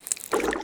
spider alert; granny; zerosiki; slender

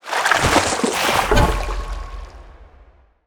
alert; bendy

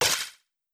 shell break; tf2ware ultimate; mariokart

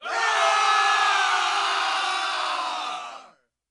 battle cry; zombiesurvival

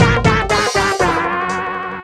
failure; tf2ware ultimate; music game; ds diy warioman

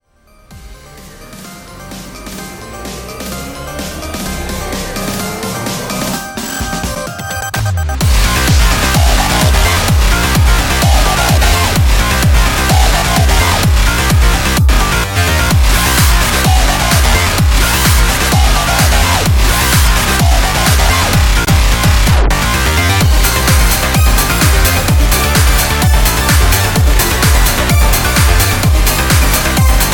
ominous; zombiesurvival; forest rogue

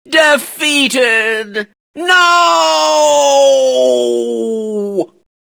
death; slender; svencoop

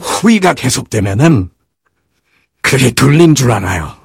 engineer laugh long

